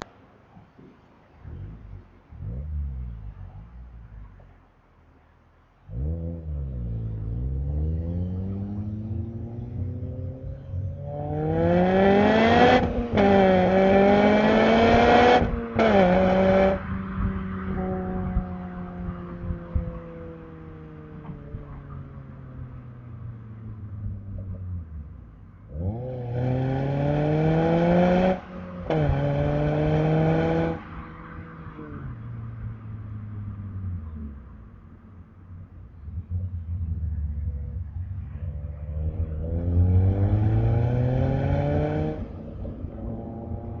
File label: exhaust, cars